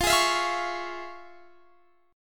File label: F Minor Major 7th Sharp 5th, Guitar Standard, chord; strummed; JGuitar